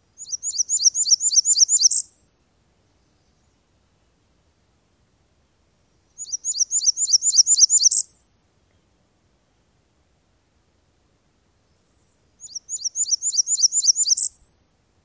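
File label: songs, Blackburnian Warbler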